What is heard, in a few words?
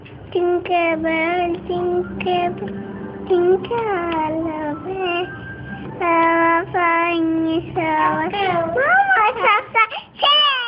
Baby Sing Jingle Bel